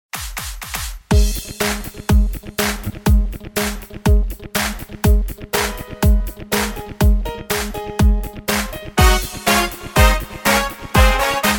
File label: celebration song style voice, Korg PA4X